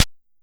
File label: Bass Snare, Jomox Airbase99, Drum Machines